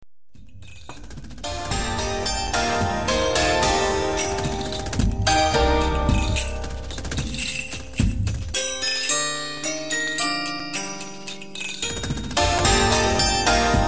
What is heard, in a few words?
march